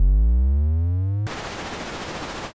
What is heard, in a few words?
machine start